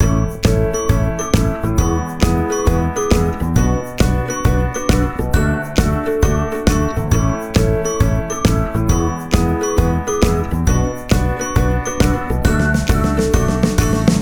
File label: Balloons Sound